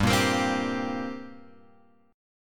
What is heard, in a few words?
G Minor Double Flat 5th, G; Gmbb5 Chord {3 1 X 3 1 3} strummed